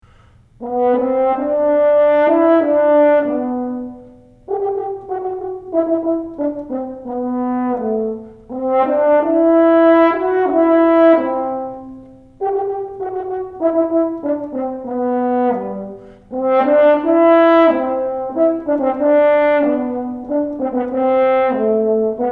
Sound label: French Horn Junior High